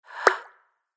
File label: Receive a message, notifications; Rise 54; Orange